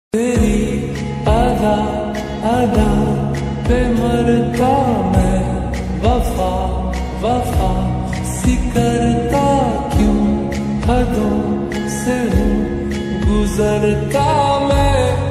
slowed hindi ringtone

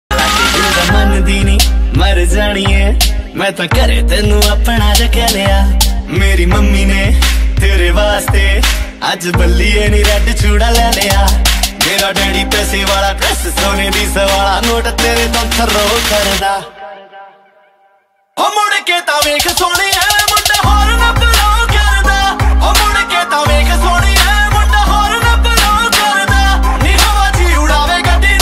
Hornblow punjabi ringtone

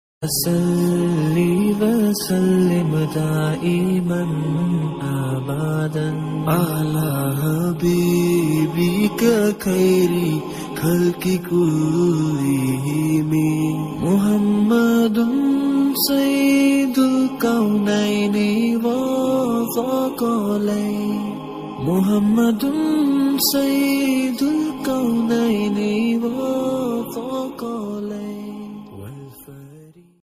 Durood ringtone, islamic ringtone

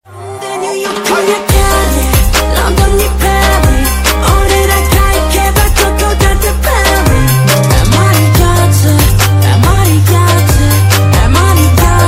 korean ringtone

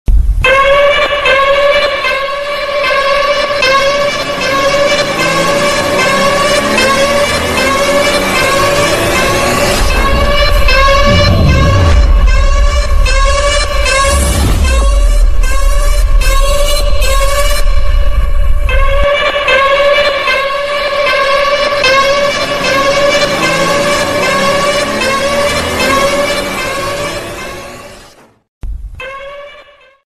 instrumental background ringtone

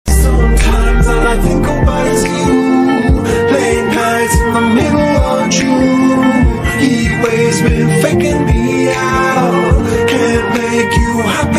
mashup english ringtone

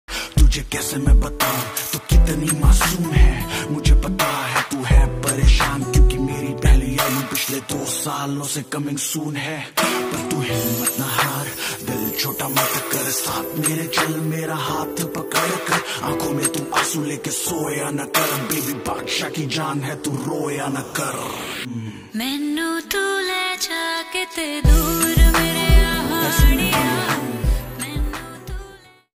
rap ringtone

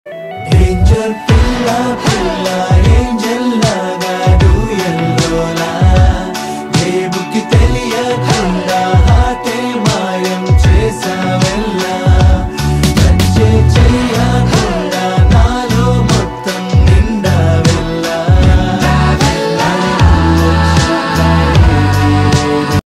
telugu ringtone

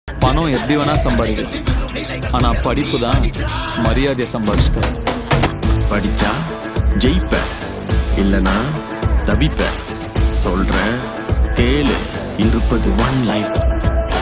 tamil ringtone

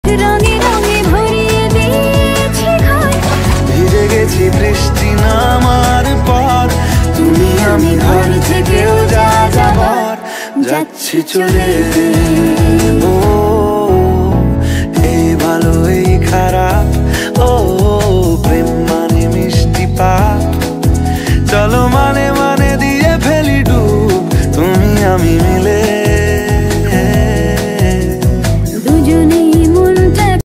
bengali ringtone

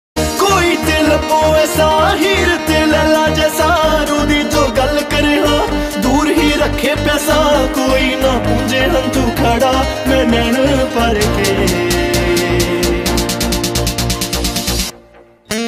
punjabi ringtone

